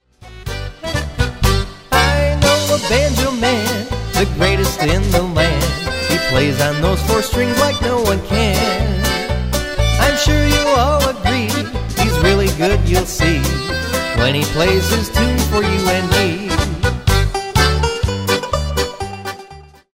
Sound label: Banjo Polka